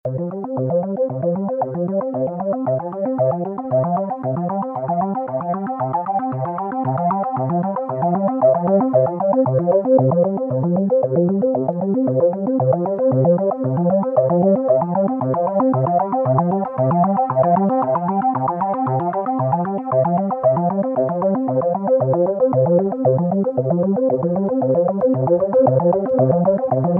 mp4 arpeg pan; elkorus

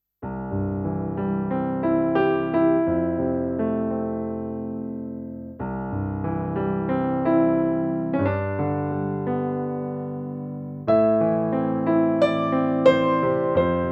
Korg D1 Piano

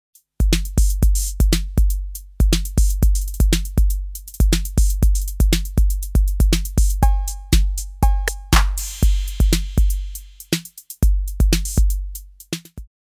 808 dry

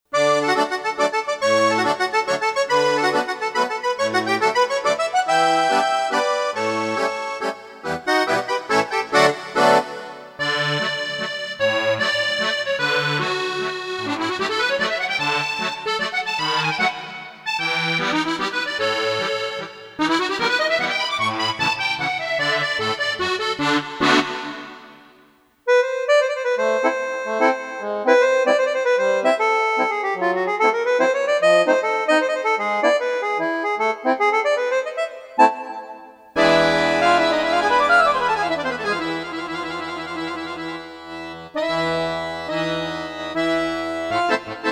Accordion Mix

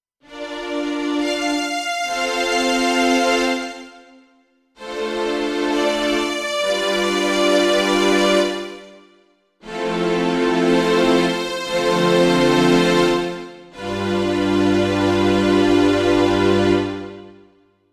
Korg Grandstage Strings Legato Strings

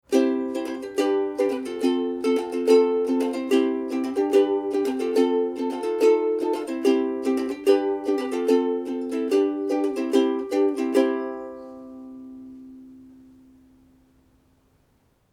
Harley-Benton UK-11-NT-RW Strumming

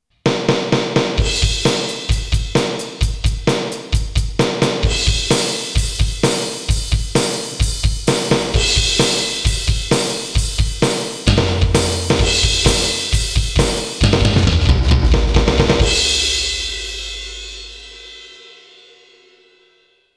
Alesis Turbo Mesh Kit Rock Kit

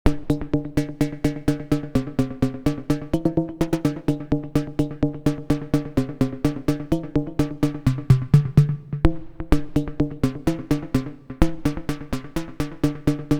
XAOC Test Trig Out

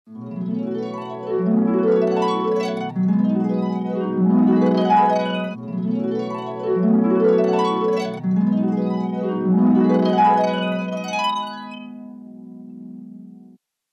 Mellotron M4000D Long Harp Arpeggio

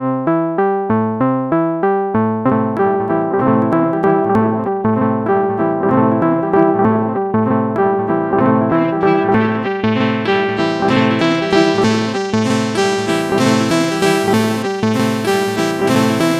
Sequencer; Bastl Thyme

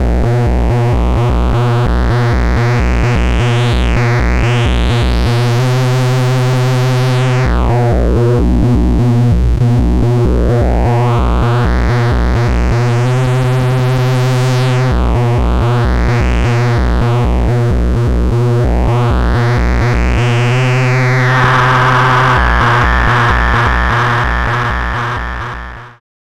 pittsburgh taiga 3 pwm bass

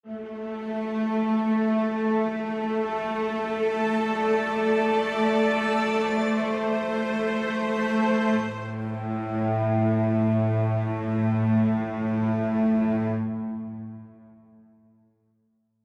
Traditional Sustains

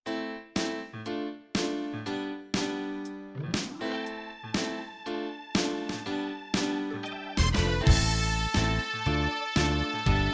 CTK4400 Fast Soul

